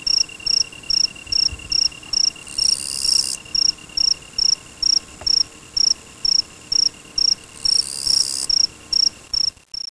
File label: insect